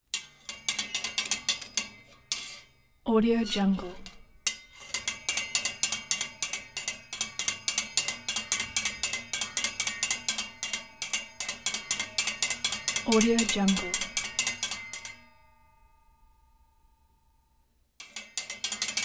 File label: ratchet light screwdriver in metal